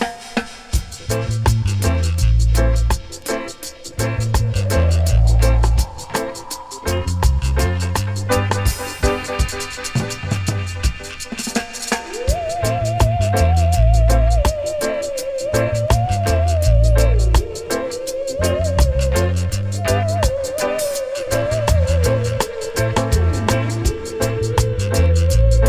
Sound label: theremin in hand